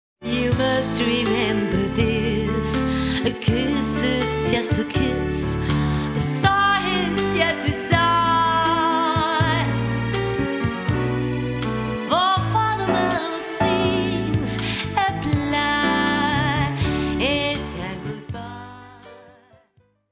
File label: swing